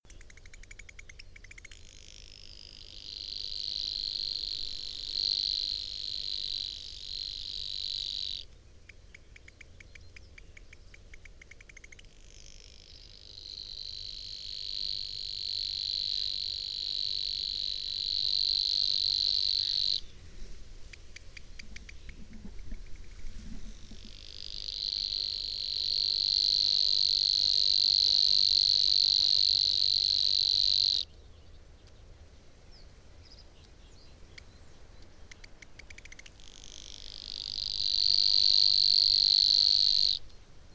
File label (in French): locustelle luscinioide; chant